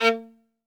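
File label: solo violin, strings; ILIO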